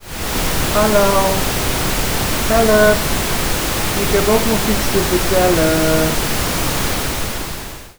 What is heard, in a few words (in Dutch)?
Ruis